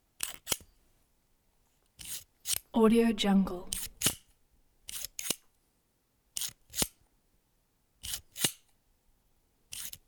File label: 45 colt saa pistol single action army eject